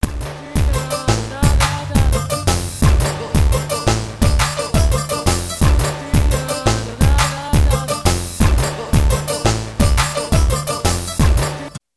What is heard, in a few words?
Beat